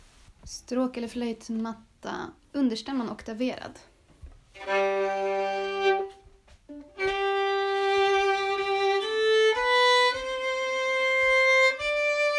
Stråkmatta överstämma oktaverad Understämma

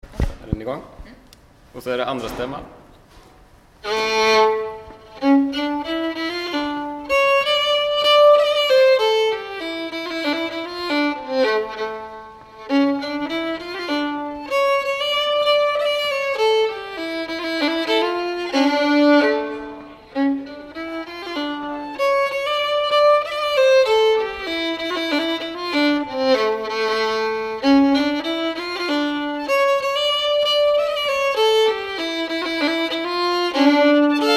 2a stämma

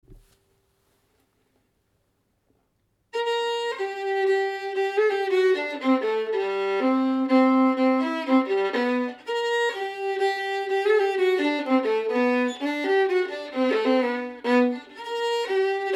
vals stämma; Ulrikas

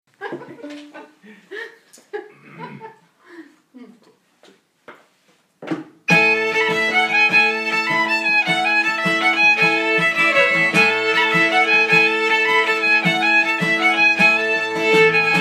Svängrumpa 1a 2a stämma

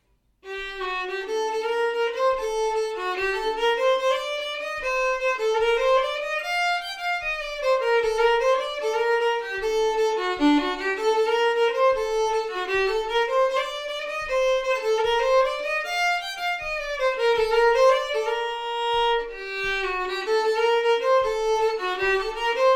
polska; jon erik hall

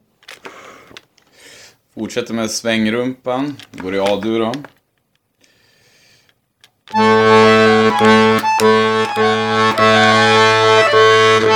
Svängrumpa komp

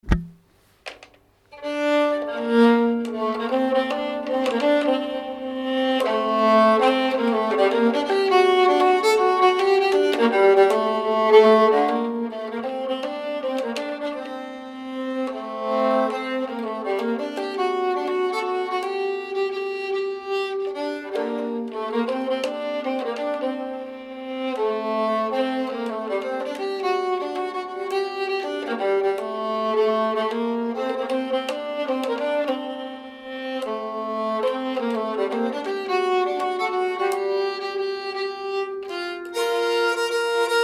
stämma

